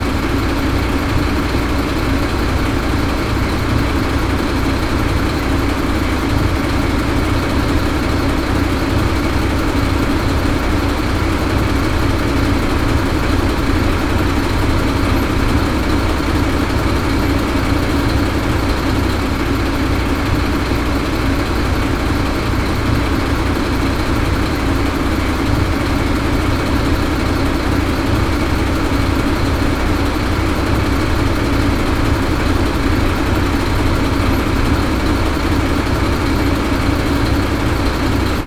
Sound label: transportation truck engine idle slight distance